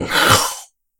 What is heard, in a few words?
enemy Died